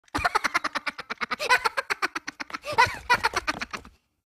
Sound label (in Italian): Risata Minions Long; Tono di notifica; per Cellulari Android o Iphone